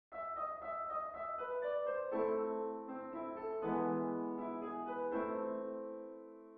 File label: fur elise chord example; Beethoven; Augmented Piano Chords